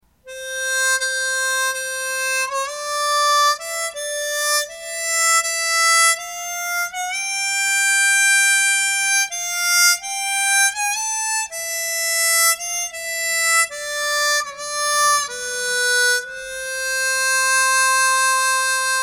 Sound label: bend; furusato